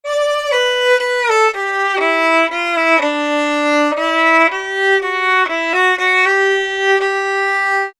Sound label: Fiddle, Appalachian; Continental Drift Aftershocks